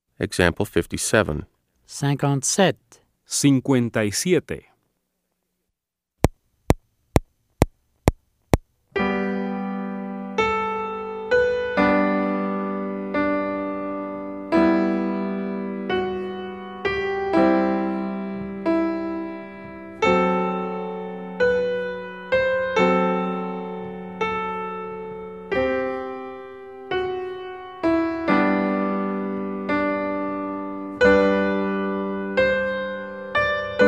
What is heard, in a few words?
Keyboard